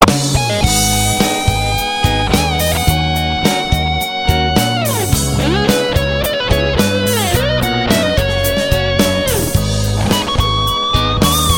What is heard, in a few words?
awsm guitar